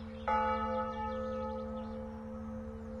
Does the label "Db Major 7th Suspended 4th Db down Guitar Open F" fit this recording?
No